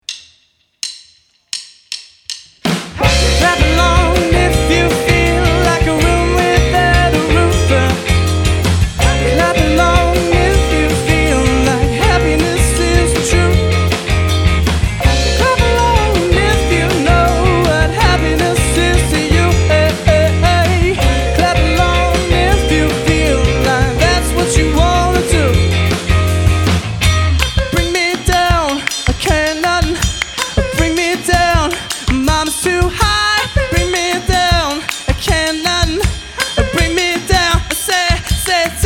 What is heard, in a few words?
Rock Pop Band